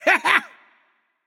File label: nv yay; smite; xbalanque